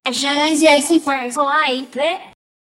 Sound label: wet squeaky fart, squishy